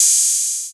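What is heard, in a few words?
808 open hi hat; Wizoo - Platinum 24 Electronic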